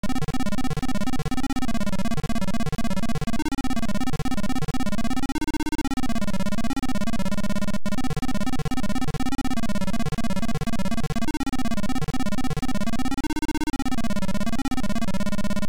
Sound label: Intro Beeper